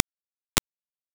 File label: Sinewave